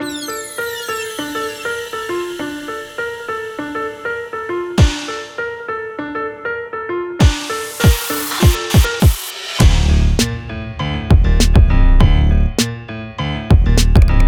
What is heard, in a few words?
Piano Trap Type Beat BPM100 Dmin